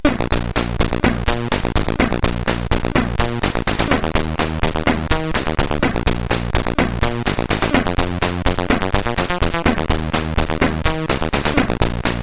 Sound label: T001 sid CSG8580R5